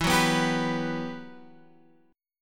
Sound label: G Major 7th, E; Gmaj7/E; strummed